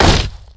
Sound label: kick; CosmicRage